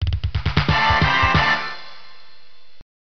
Winner Fanfare, Game Over; Following Festival; Shogun Warriors arcade gamerip 1992

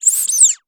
toy rubber duck squeeze squeak; a dump in the dark

